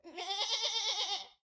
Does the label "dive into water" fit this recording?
No